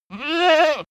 goat baa

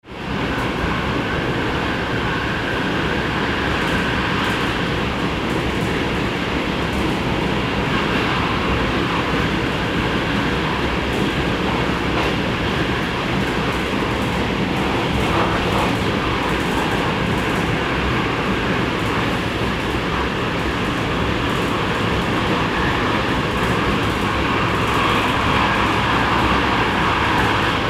regional train cabin inside

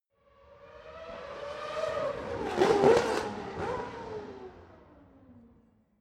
racing car f1 drive by shift up down

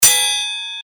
sword hit

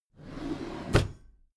packed drawer smooth open regular

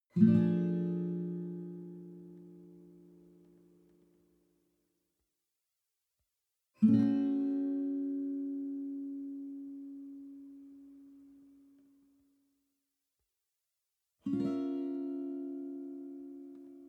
western guitar chord high diminished soft

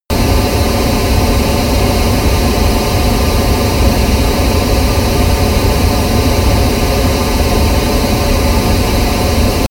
plane airbus a320 turbine idle direct

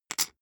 poker chip stack small rattle short

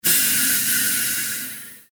airpump

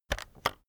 keyboard external enter press slow heavy

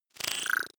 data transfer splutter

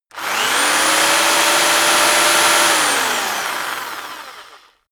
rock drill rev medium very long

